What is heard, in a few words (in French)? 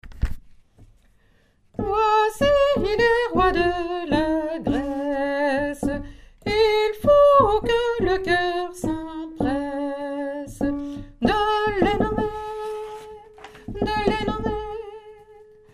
tenor, chorale